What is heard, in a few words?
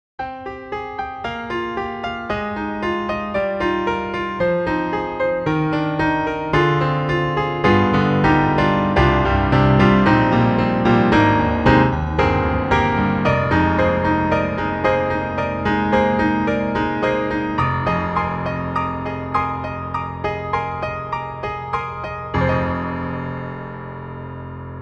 D-PRO Mini Piano (hall); Cakewalk